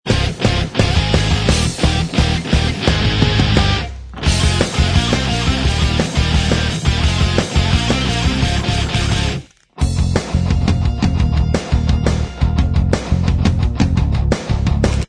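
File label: instrumental, backing tracks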